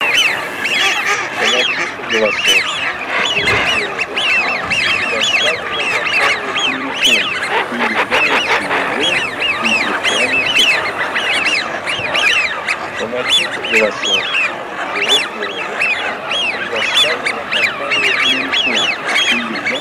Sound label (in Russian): Свиязь Fischione; plurifon